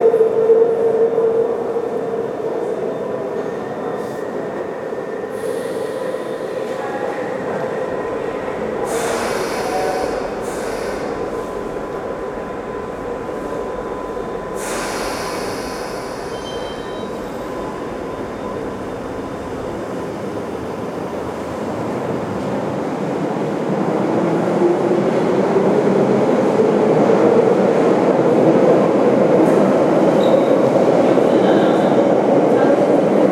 Underground Station Ambience, Knightsbridge, Train Station Atmos; British Train Station Ambiences; Jake Fielding